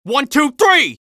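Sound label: scout taunt rps exert, male voice; taunts; tf2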